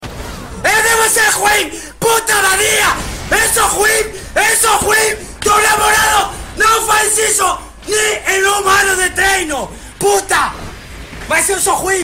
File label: relax music